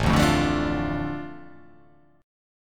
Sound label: A Suspended 4th Sharp 5th, A